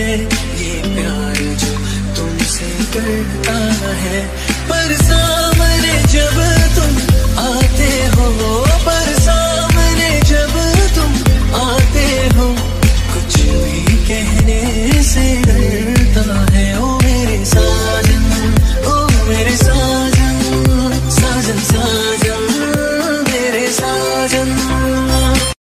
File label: dj ringtone, bollywood ringtones